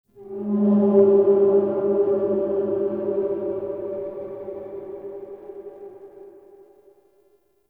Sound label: Unstable Texture; Ambience; Warframe; Shadowgrapher